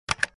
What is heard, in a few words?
Button